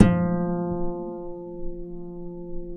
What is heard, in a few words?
F2 HARP PP; HARP 3